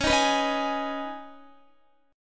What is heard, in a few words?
Db 7th Suspended 2nd Db, down, Guitar